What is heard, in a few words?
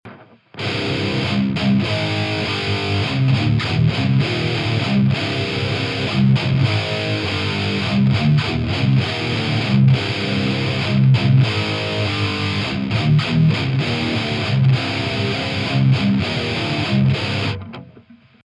TS Bogner Marshall